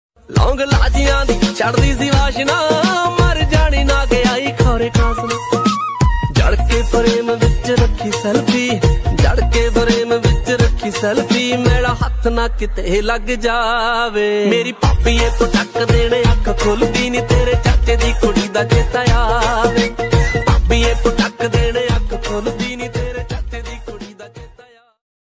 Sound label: Punjabi Beats Ringtone